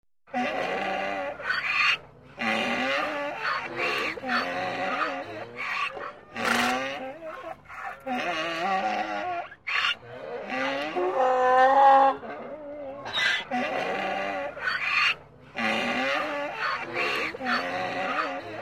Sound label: Donkey braying and cows mooing